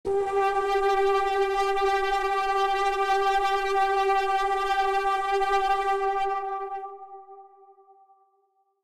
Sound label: Harmony Hermit Angry G3